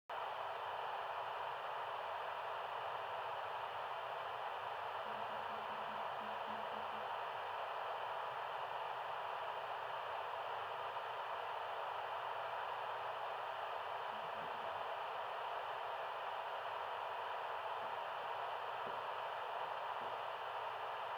Walkie Talkie, Cobra MicroTalk, Radio Communication, Static, No Speech; Sergey Eybog; Handheld Tranceivers